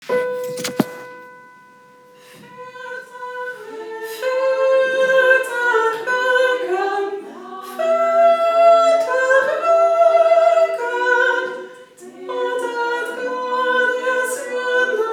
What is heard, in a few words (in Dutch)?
Veertig tenor